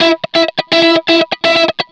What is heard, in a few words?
125 a, guitar licks; house; Vocal House; PS-28 Vocal House Samples; instrumentloops